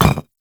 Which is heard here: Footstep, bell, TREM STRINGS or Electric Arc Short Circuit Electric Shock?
Footstep